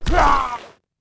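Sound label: damage, monster; ARCANE; scholar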